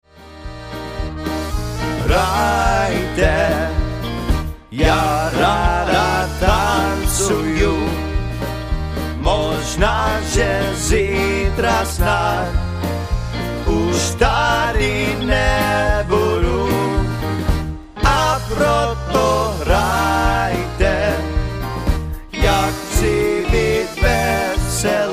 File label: Polka